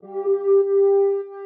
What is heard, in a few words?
100 G Pad; Fandango